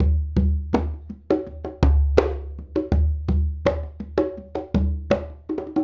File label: Congas; Essential Percussion